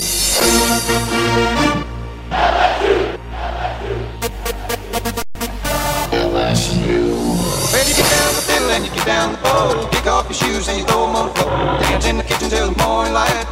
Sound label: cheer remix